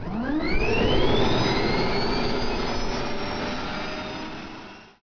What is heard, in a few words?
reactor on; StarSiege